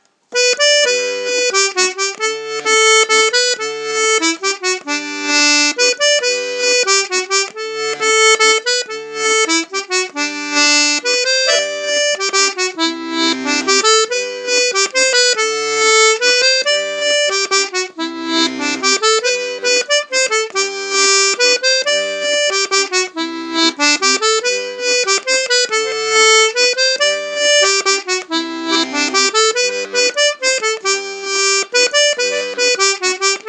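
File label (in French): mazurka, accordéon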